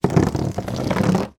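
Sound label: hand run across several dvd cases in wooden rack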